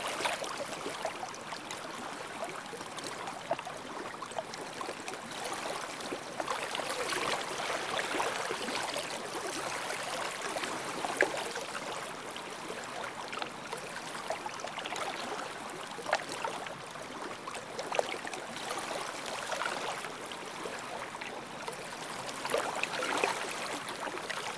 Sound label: Ambient Water; DCS